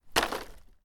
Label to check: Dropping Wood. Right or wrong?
Right